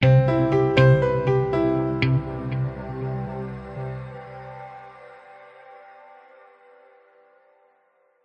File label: multimedia musical logo branding signature happy bright corporate piano muted; guitar pads